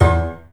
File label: PICK F2; InVision Interactive; The Piano